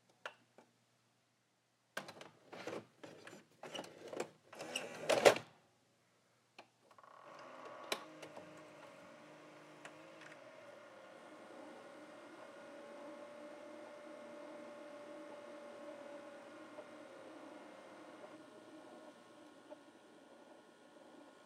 Vhs Insert Play Eject

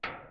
Metal Ping

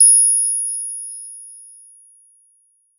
Tonic High Frequency Sonar

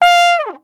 Trumpet

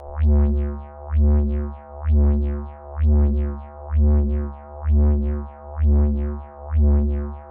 Didger Like Bass

